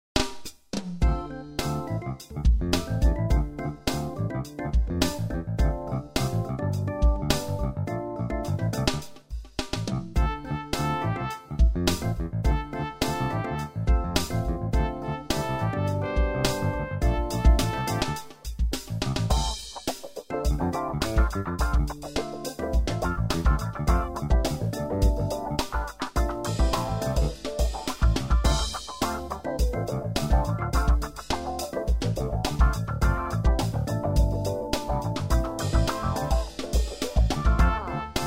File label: Instrumental